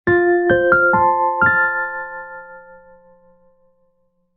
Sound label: message alert tone